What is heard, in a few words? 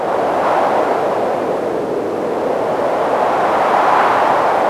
WHITE WIND, AX-60; Rubber Chicken Software Sample Bank